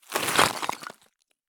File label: stop tile; Escape from Tarkov